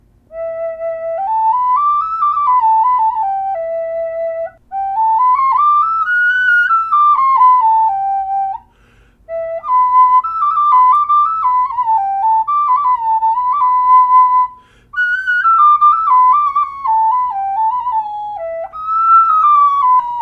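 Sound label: E5 Rhodo branch, Native Style Flutes; E5 branch in; Rhododendron; bright litte player!; Ugly Boy Flutes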